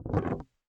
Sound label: noise muted strum, swag bass